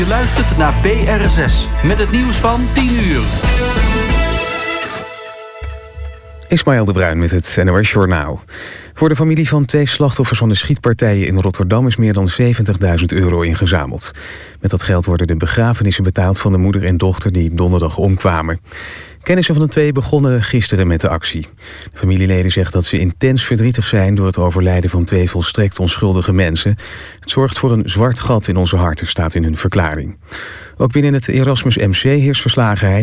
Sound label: Jazz